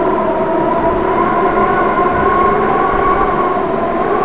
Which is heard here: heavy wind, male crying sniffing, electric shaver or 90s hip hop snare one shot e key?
heavy wind